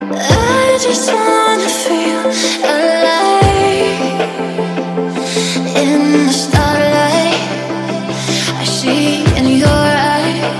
female english song ringtone

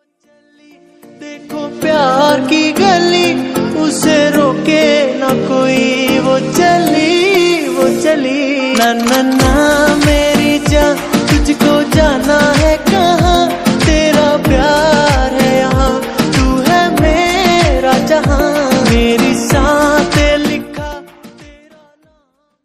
hindi song remix bollywood ringtone

